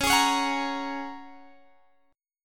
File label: Ab 7th Suspended 2nd Suspended 4th, Db